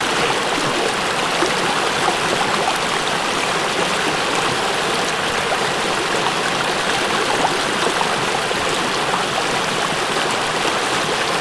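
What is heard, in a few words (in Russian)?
водопад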